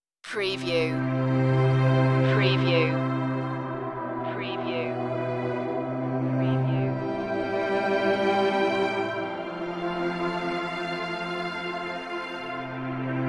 ambient dream

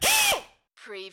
Pneumatic Drill

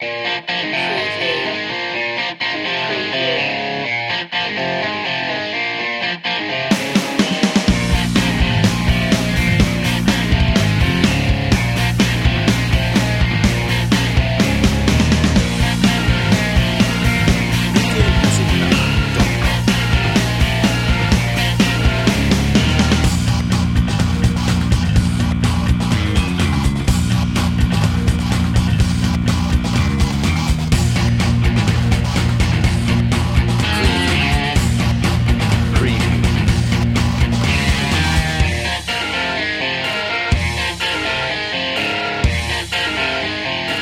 rock house

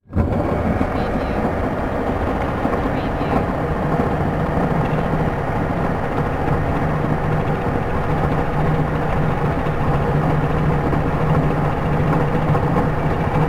Crane Large; Industrial / Machinery

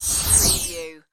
Magical Shimmer Burst Bright; Magic / Spells / Fantasy

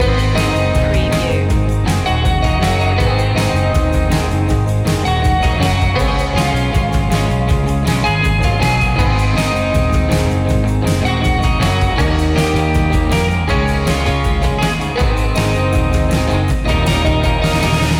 surf loop

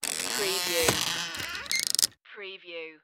wooden hut door open

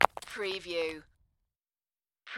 Rock Fall And Tumble Onto Rocks

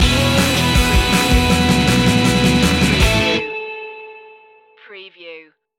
maximum rock n roll stinger

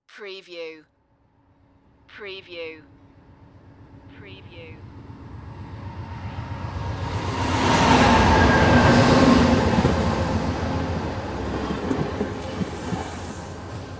Passenger Train Arrive; sound-effects-bible

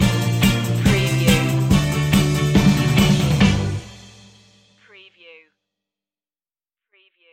rhythm kings stinger

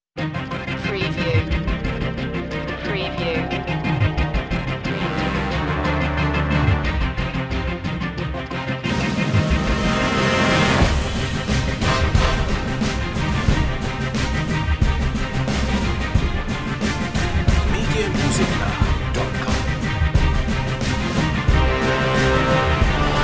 no choir

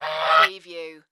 Bird Goose Honk; sound-effects-bible

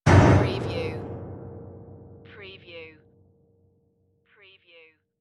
Piano horror smash; beanstalk audio

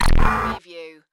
Hi-tech Panel Servo Button

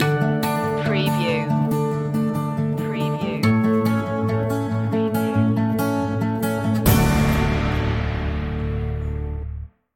earthbound stinger

